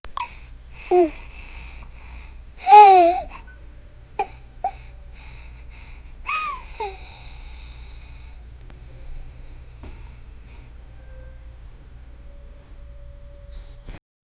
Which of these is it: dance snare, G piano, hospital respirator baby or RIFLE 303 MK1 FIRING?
hospital respirator baby